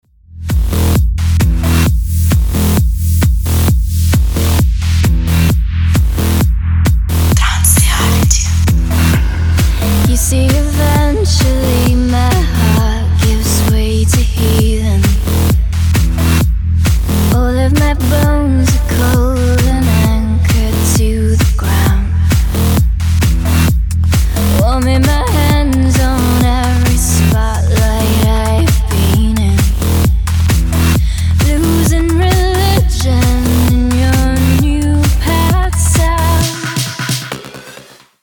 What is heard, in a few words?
ringtone